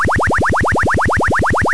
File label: generator sweep mono